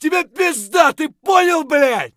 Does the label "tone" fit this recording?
No